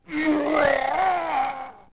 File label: Hit Final; Ganondorf